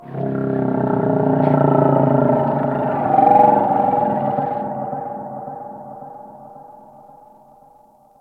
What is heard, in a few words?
Ocean Varous Creatures; Warframe; Grineer Ocean